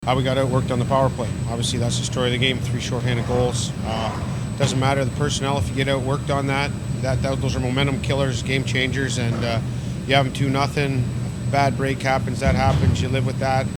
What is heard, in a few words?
bell; Belleville Senators